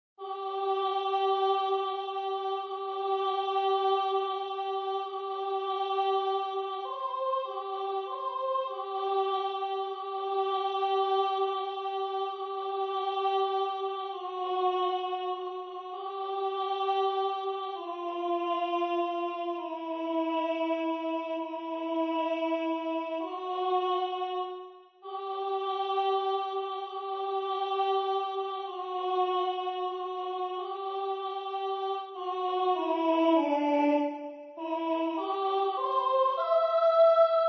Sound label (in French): soprano; Variété; Chorale 4 voix